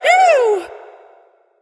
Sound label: Wound Crit; Julianne